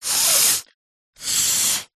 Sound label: human nose blow single nostril hold other x2